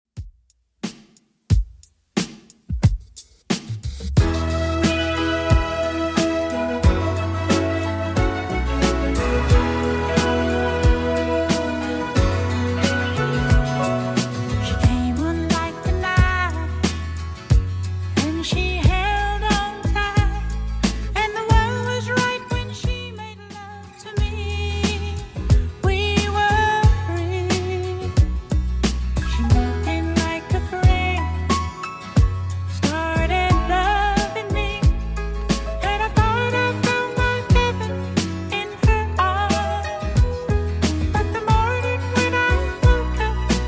70s Redrum 90bpm

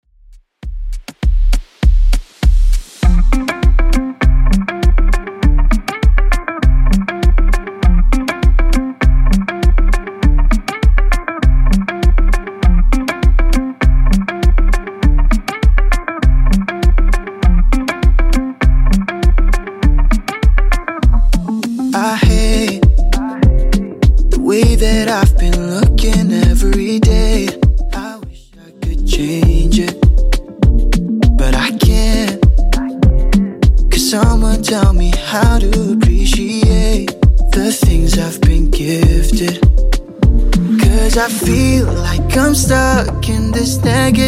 Top40 Redrum 100bpm

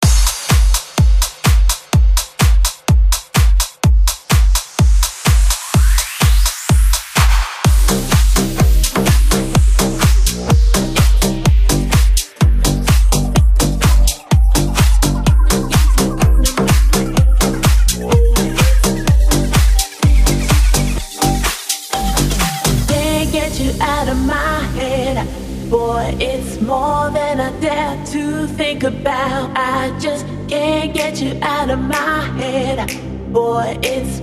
future house redrum 126 bpm

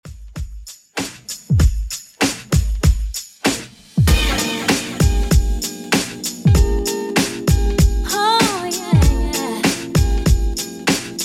90s Redrum, 97bpm

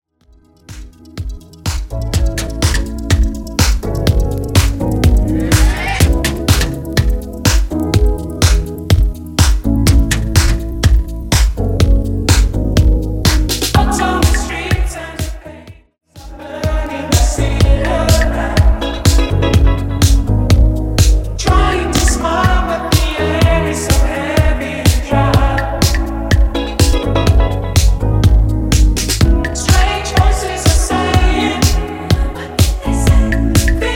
Re Drum 116bpm

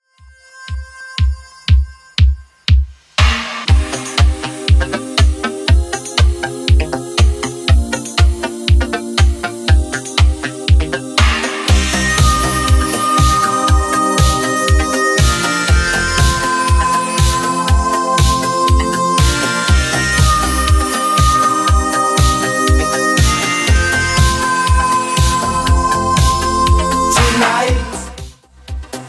80s Redrum 120bpm

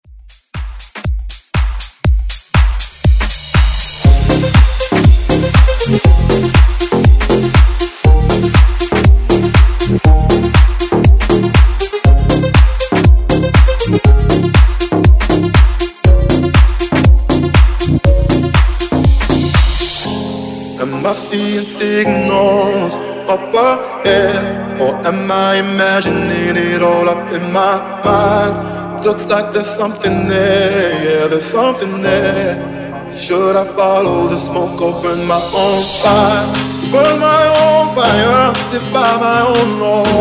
Dance Redrum

